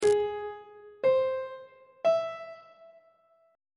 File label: f harmonic minor chord 3 is a flat augmented on treble clef